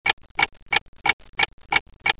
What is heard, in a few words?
GOOD clock